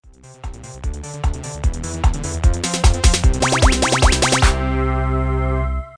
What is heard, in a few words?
Dance Jump